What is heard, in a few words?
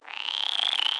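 ambience China 3D ambience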